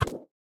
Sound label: rotate big; Factorio